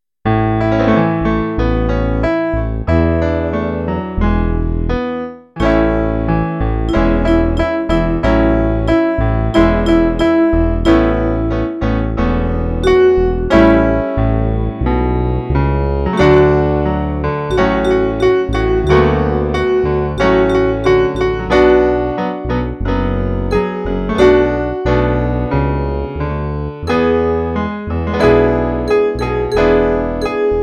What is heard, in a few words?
Sine Vox